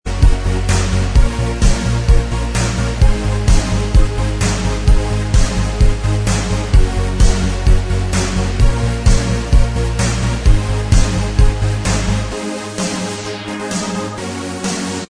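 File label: instrumental, track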